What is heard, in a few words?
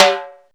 DRM F#, YAMAHA R5+RX; Best Service ProSamples vol.55 - Retro Sampler; AKAI